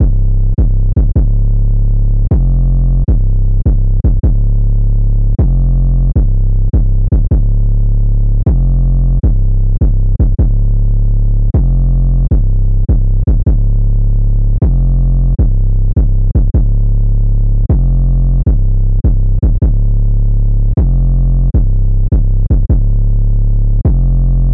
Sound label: 156 BPM Cm